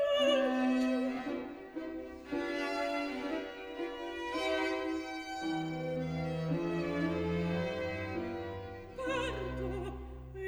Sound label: opera, Recitativo